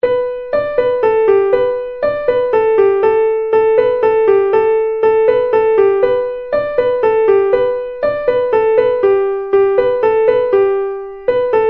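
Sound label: Whistle, Traditional Irish Music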